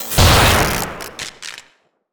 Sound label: Transformer Explosion; Monster Jam Steel Titans; PS4, Stadia, Steam, Switch, Windows, Xbox One